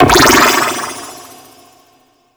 Prism Bounce; Warframe; Warframes; Harlequin

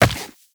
Run Rock; Footsteps; Warframe; Tenno

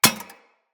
Bullet Impact Generic Metal; Weapons; Surface

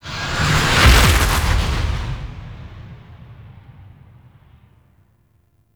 Grineer Ship Liset Fly By Quick; Vehicles; Warframe; Liset Flight

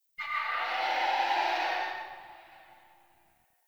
Tree Distant Critters Angry; Warframe; Duviri; Ambience

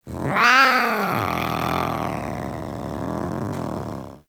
Feral Vocal Idle B; Warframe; Catbrow

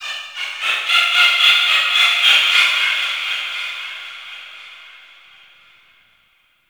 Forest Distant Bird Of Prey; Warframe; Grineer Forest

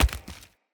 Tree Dead Small Destroy Chunk

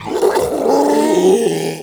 Infested Ancient Strike Scream; Warframe

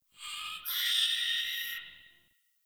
Tree Critters Angry; Ambience; Props

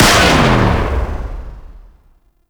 Sentient Sniper Shot; Warframe

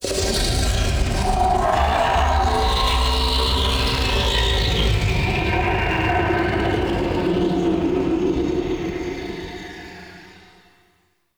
Misc Chasm Scream F; Ambience; Warframe; Man In The Wall; Entrati

